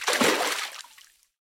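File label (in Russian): волны бьющиеся друг об друга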